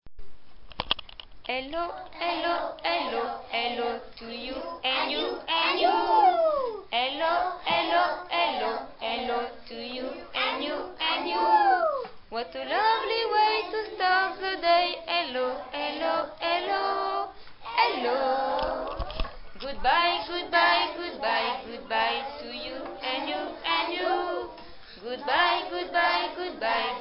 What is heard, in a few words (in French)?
CHANSON ANGLAIS HELLO; en anglais